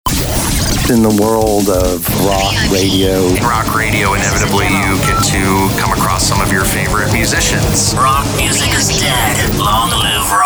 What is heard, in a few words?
ROCK Radio